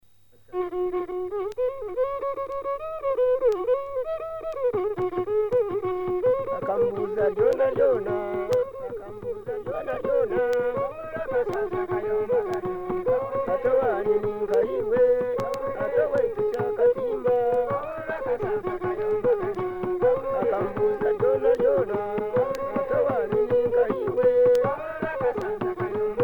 Endigiri, uganda

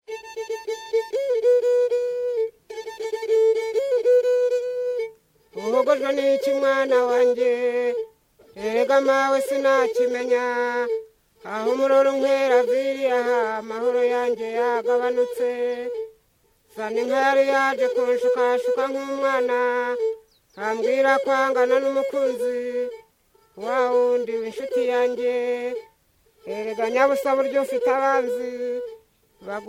iningidi

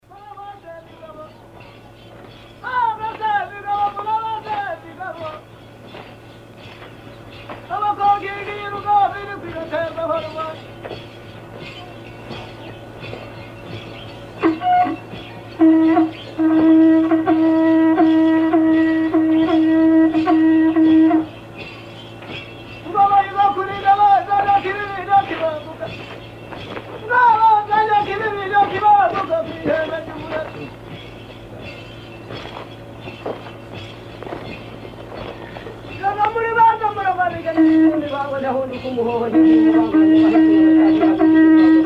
inzogera, burundi

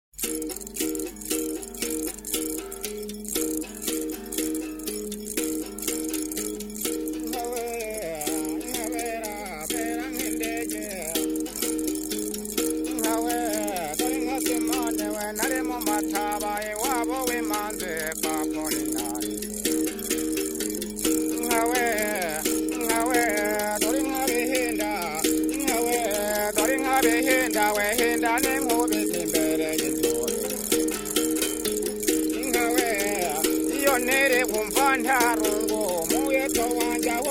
umuduri, rwanda

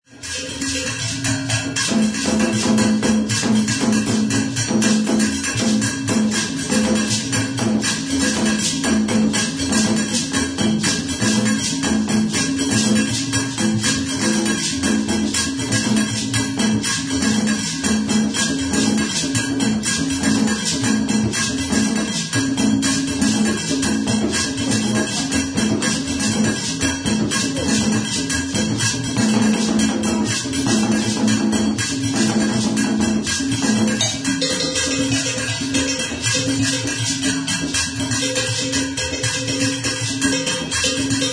apentemma, ashanti, ghana

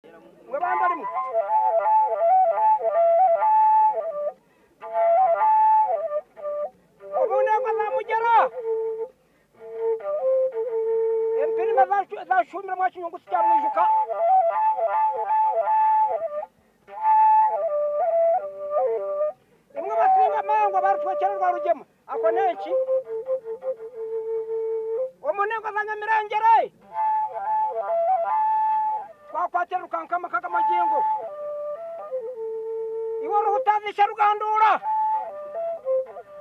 Ekinimba, uganda

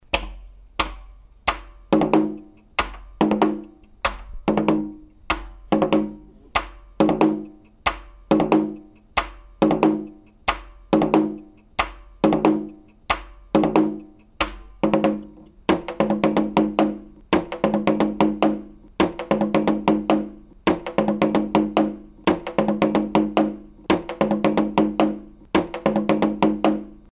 atsimevu, ghana, efutu

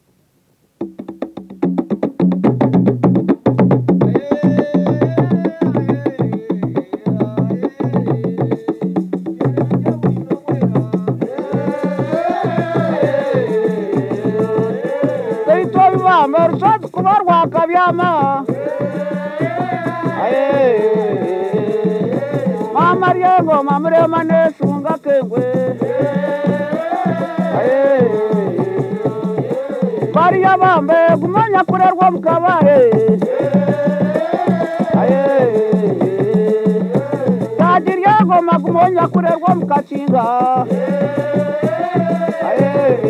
Engoma, instruments uganda

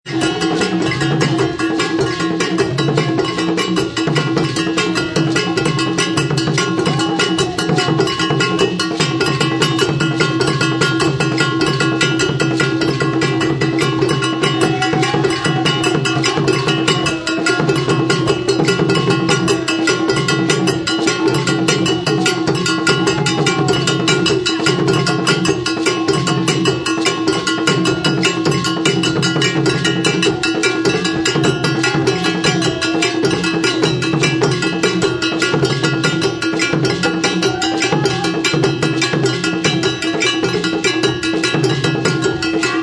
adowa ensemble, ghana, ashanti

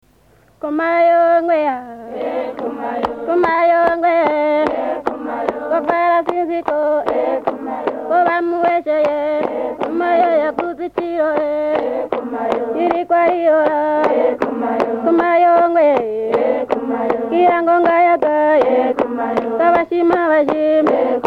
birth, Burundi, Hutu; Muramvya

